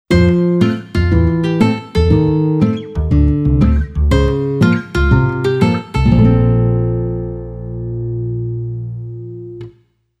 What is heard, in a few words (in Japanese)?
ジングル